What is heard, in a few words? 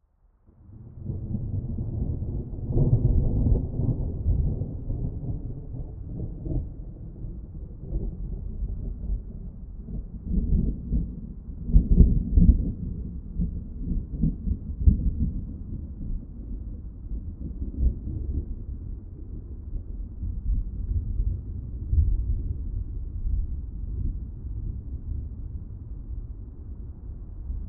Thunder distant very long peel; boom library; Thunder And Rain